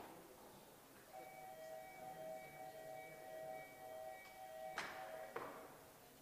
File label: division bells